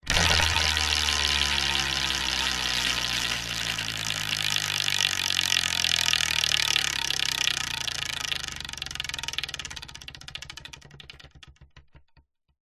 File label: leisure wheel of fortune wooden spin fast